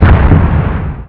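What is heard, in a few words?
medium explosion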